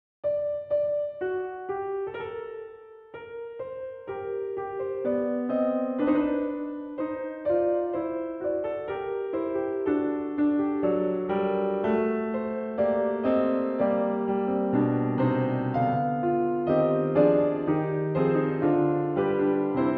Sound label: Capriccio in B-Flat Major, Andante; BWV 992 II.